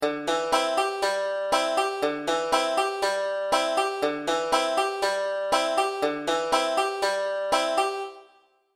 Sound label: c hammer example, banjo; beginning 5 string